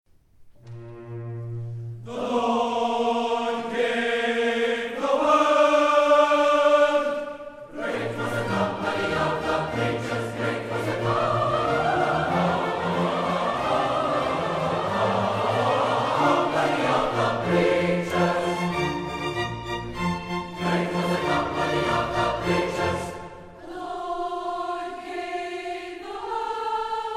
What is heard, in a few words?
concert choir